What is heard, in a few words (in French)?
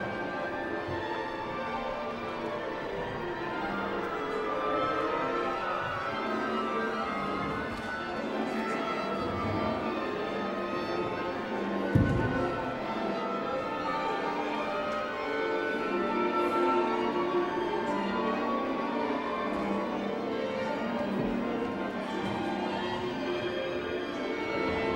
orchestre